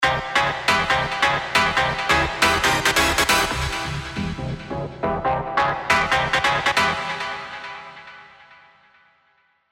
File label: Stab; Stab Techno Master; Revealed Serum 2 Big Room Techno Drops Vol. 4